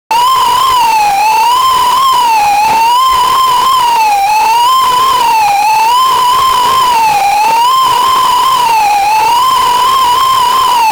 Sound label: lion